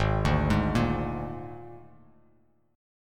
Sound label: G sharp Suspended 2nd Flat 5th; 4,5,6,3,x,x,x,x